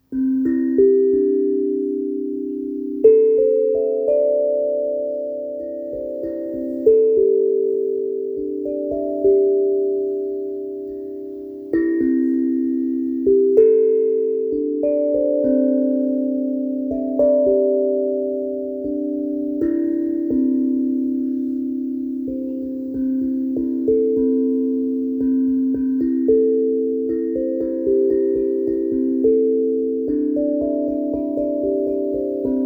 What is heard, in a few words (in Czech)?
naratajzeleny, kovovy buben nataraj maly c pentatonika; oranzovy